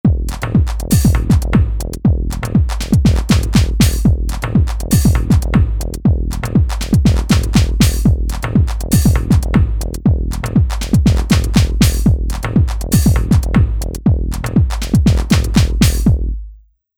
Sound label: Techno